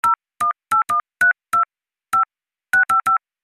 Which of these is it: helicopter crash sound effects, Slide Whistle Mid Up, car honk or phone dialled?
phone dialled